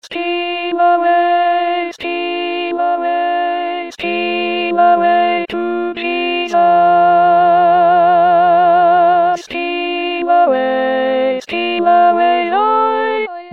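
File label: alto; Steal away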